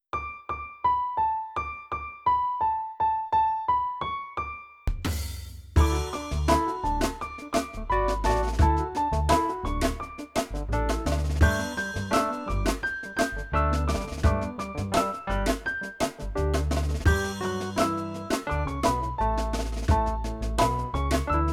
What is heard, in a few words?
count with me instrumental; wow english